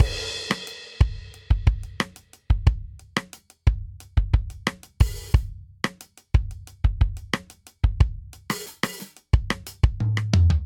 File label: Stadium Rock Outro 90 bpm, Drum; 4 4; MIDI Clips; Ableton; Factory Packs; Drum Booth